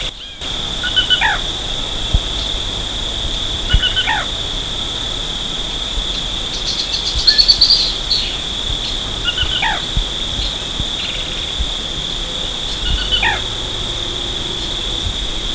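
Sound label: Black rail kic kerr; National Marsh Bird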